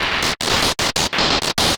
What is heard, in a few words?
glitch